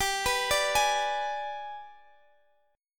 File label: Ab Minor Major 7th Flat 5th chord